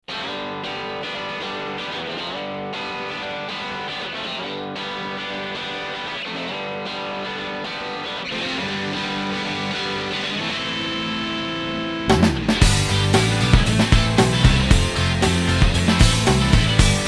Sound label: Raucous